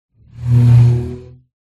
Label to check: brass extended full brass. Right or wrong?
Wrong